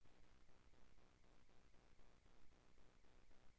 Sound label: bowl